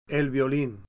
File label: VIOLIN